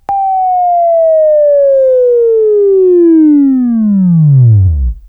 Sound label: WAV Left, Sweeping; Sweeping Training program